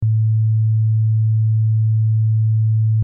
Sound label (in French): sinus